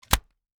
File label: Technology CD Case Close